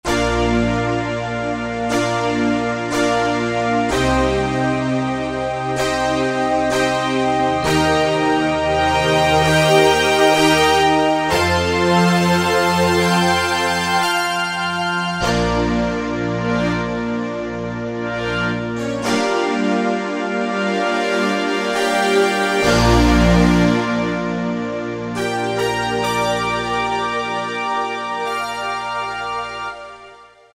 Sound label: Ballad Stack, Kurzweil K2000; Kurzweil K2000 Contemporary ROM